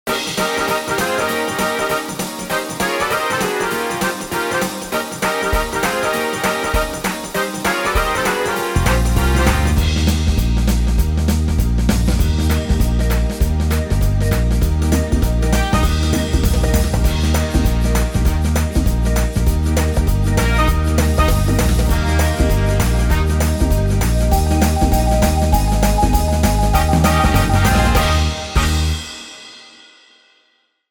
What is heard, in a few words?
Jive; Wersi